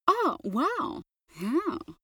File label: female impressed; koerpergeraeusche; menschen; soundpool